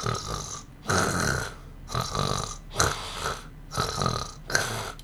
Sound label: snoring